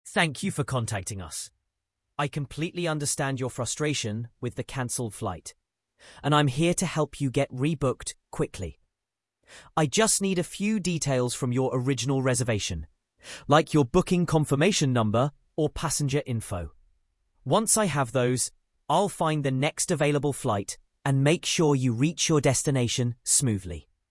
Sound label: Ballad Sample Audio